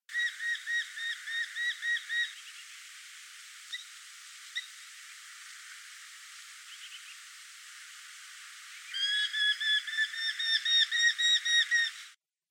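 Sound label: Eurasian hobby voice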